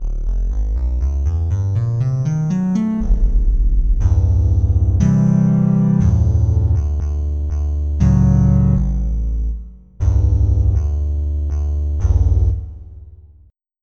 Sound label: Sweep, synth